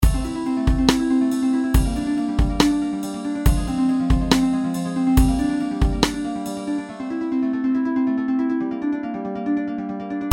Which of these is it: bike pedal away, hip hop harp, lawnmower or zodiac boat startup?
hip hop harp